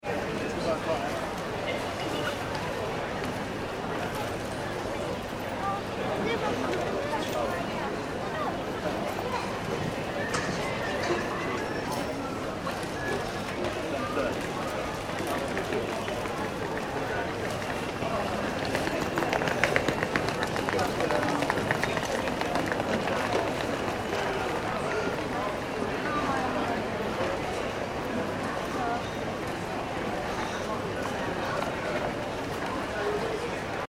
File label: ambience airport departure lounge; Airport Ambience - Departure Lounge - London Stansted Airport; Airports (Sound Effects:Backgrounds); McKinney Sound